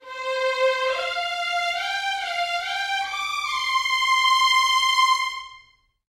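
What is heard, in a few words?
violin slur port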